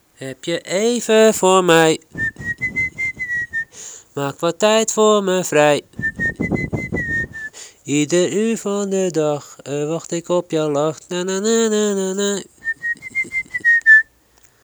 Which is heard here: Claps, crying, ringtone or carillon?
ringtone